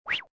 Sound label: sent message success; Infinix Hot 12 Play